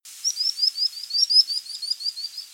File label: Common Sandpiper, Flussuferläufer